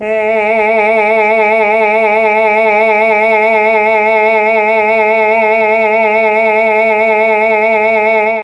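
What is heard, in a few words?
A2 chamber male, mellotron; CHMB MALE VC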